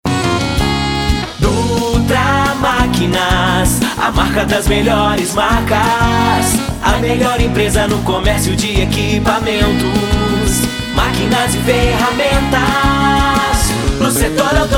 jingle; dutra maquinas

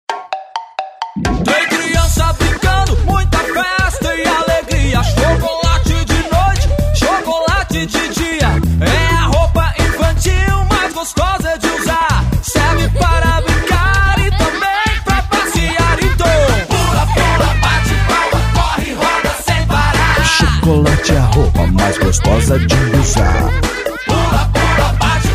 jingles loja de roupas infantis

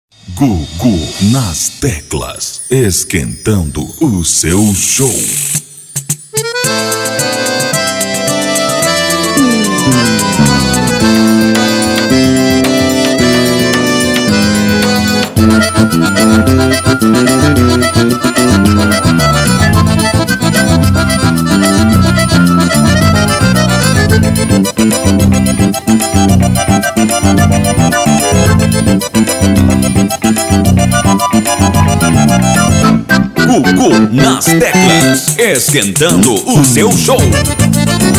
baiao de gato

